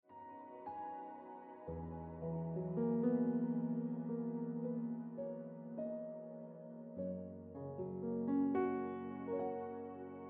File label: Piano Music